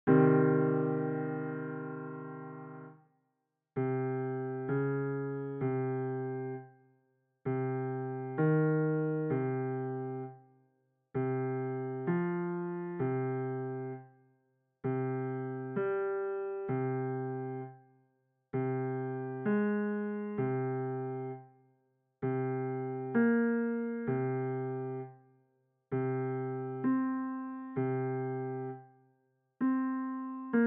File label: Male Beginner Slow 3rd Degree, Intervals